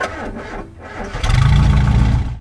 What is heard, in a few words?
airflow on; Mafie 1